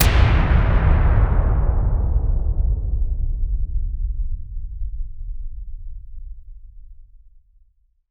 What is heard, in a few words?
BLIP BLAM, Impacts, Hits & Booms; Distored Reality